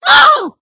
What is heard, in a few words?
Stand leg poke